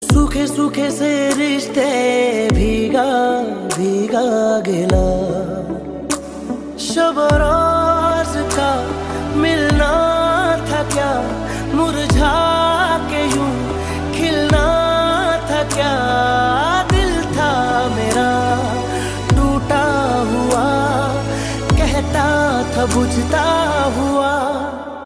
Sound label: pakistani ringtone